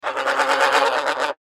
Bird Goose Honk, Bird Goose Honk Bark Long; Geese; Geese Sound Effects; Animal

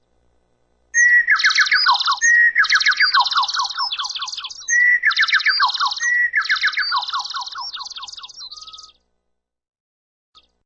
Birds Chirping Low, Multiple birds chirping.; Birds Chirping Low Pitch; Animals

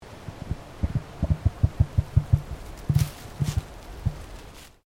Bird Emu Queensland Throat Noise; Birds in Queensland; Animals

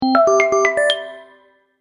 Game Welcome Beeps, video game

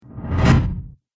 Flashback Transition Whoosh

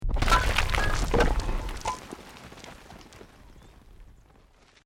ROCK DIRT SLIDE SMALL

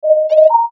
Beep Soft Buzz Tone; Multimedia; Beep Soft Buzz Tone Multiple Production Element Imaging Element Accent Transition; Blastwave

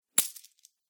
Gold Coins Drop; Video Game

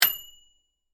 Bell Microwave, Household, Bell, Microwave; Appliances

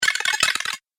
Droid Must Compute, robot sound effects; Andrew Fleming; Twistedtracks

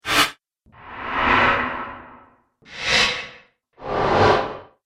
Transition Metal Swipe Collection; Transition Sound Effects

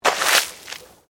Water Splash Concrete Medium; Elemental weather effects; Nature

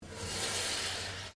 METAL TAPE MOVE; Sound Effects:Materials; Foundation3

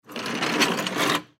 Dolly Roll, Dolly Roll Short; Rolling; INDUSTRY; Blastwave

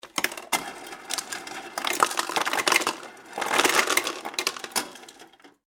Household Ice Maker Dispense Ice Into Plastic Cup; Appliances

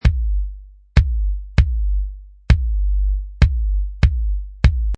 Bass Drum Loop At 98Bpm; Drum Loops (Royalty Free Music Loops:Music Production Loops)

